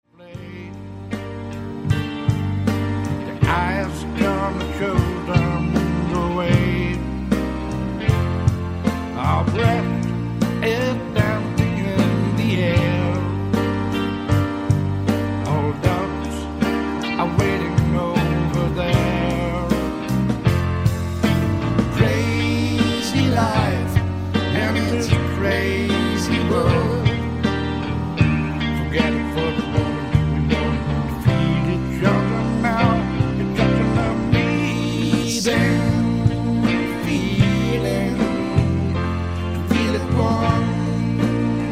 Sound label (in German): chor